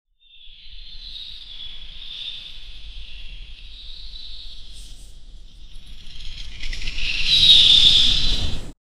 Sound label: the sound of rushing wind, wings